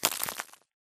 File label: bullet light ballistic concrete debris